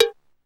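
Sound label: DR550 cowbell; 90 sSampleCDs; Crypton - DrumBase5000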